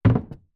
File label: wood break